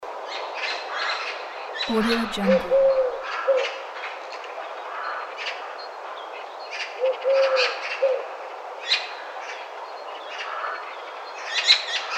birds on a sunny day in the forest

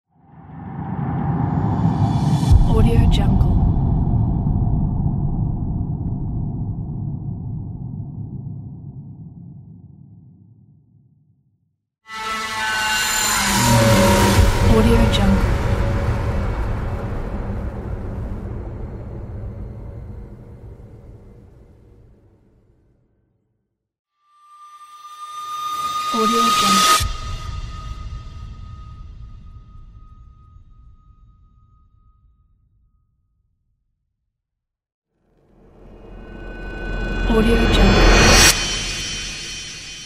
reverse rise sound; sfx pack 6

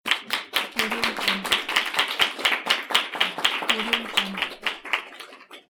people clapping in a room

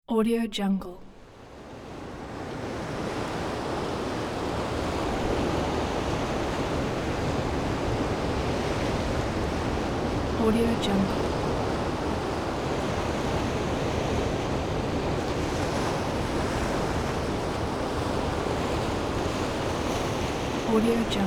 night open ocean

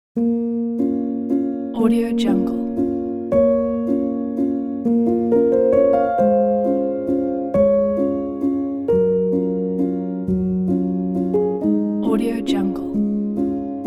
a melancholy piano

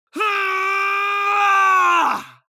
human vocal male scream forceful long

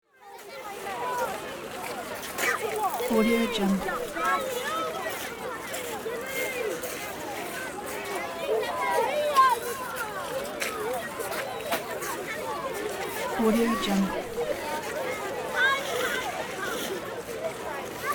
children playground

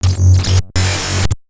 sinematic source recordings synth bassy electric; soundmorph

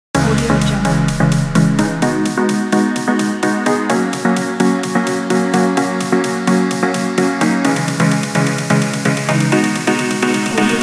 edm

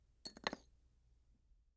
china coffee cup

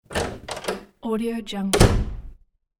house door open and close

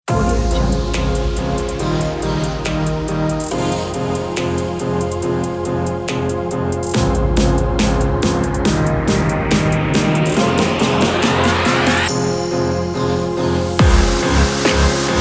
future bass

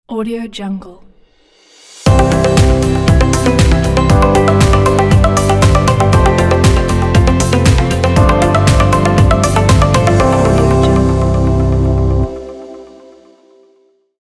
beauty logo

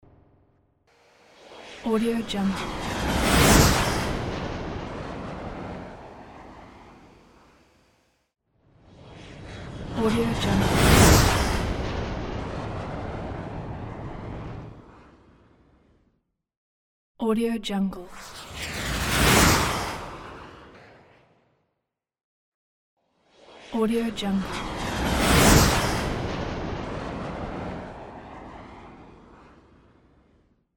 plane

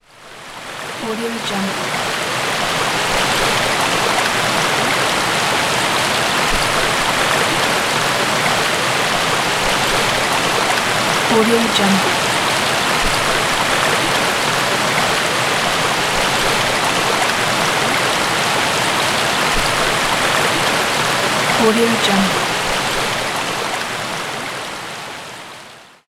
raining on the river

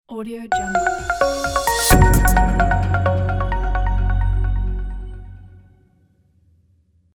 branding logo

